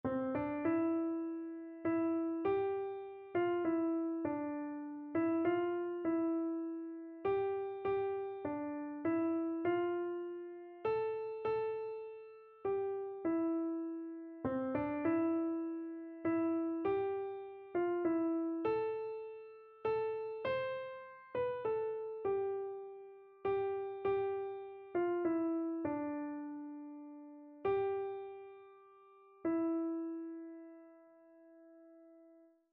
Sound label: just as i am, keyboard